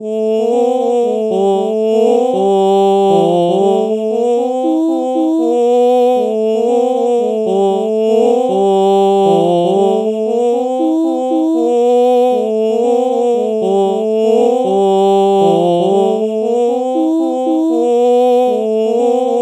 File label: oh oh melody morph low